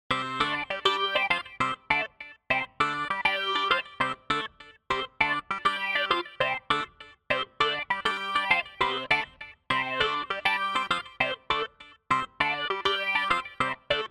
Clav Shimmer

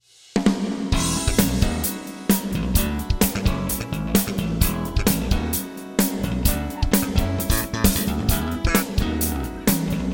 Ensoniq DP Pro Drums Band Percussion Plate

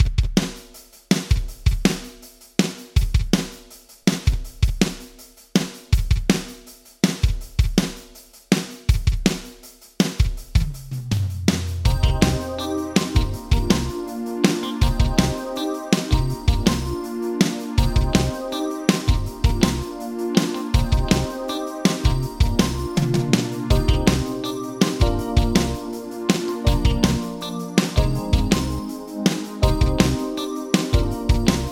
Drums Yamaha D5000 Dimension Vintage Digital

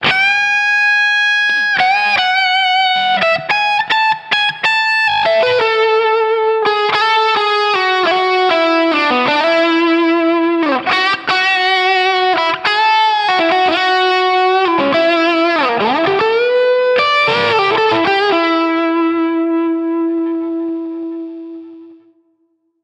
Guitar Solo Dry

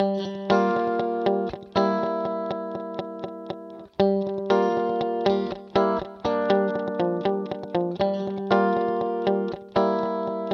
Guitar Yamaha D5000 Light Vocal Vintage Digital

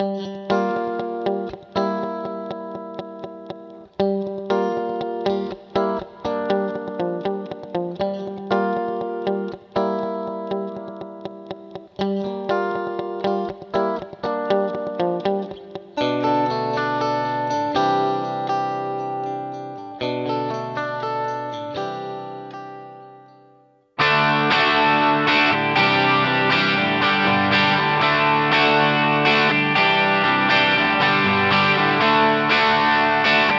Guitar Yamaha Rev7 Echo Room Vintage Digital

